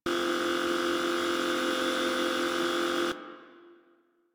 Light Loop; Ambience; Warframe; Grineer Galleon

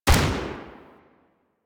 Rifle Shot A; Sundial

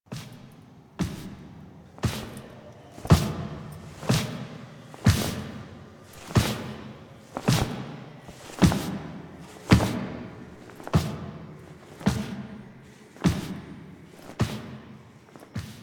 Carry Foley; Drifter; Warframe; The New War; Epilogue

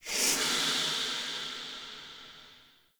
Dissapear; Orlick; Friendly

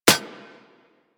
Bullet Impact Generic Metal; Surface

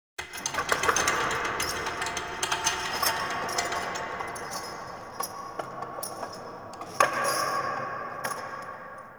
Dungeon Island Chains, Ambience; Warframe; Duviri

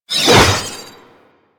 Sword Whoosh

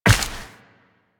Step Ice, Footsteps, Walk; Warframe; Tenno

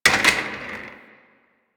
Office Chair Impact

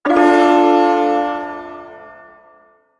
Shawzin Maj Chord; Duviri; Ersatz

